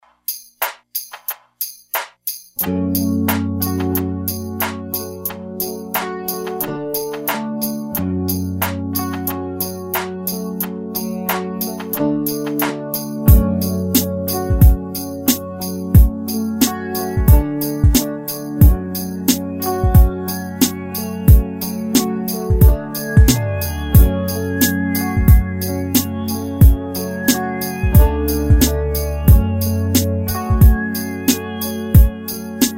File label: poprock